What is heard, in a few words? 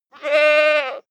goat baa male